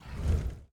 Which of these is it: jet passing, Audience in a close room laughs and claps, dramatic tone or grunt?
grunt